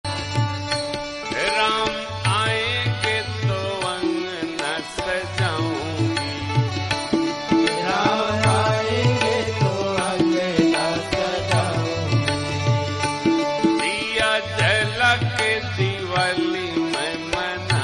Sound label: Bhajan Song Ringtone; Prem Bhusan Ji Maharaj